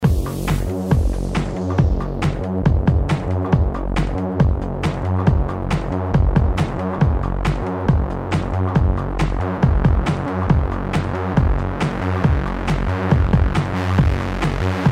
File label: Deep Cycle Bass